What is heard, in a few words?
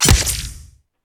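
sickle, impact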